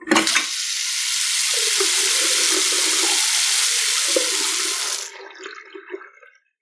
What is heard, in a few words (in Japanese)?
suido